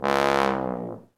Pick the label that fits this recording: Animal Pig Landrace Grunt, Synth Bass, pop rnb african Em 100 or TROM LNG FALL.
TROM LNG FALL